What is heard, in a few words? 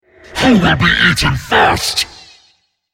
attack; Greenterror; Character Voices - English